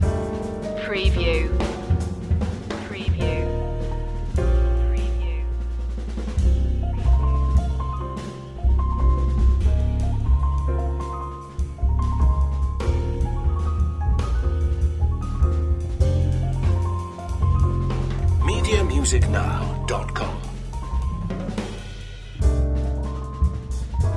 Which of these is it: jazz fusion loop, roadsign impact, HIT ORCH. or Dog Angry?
jazz fusion loop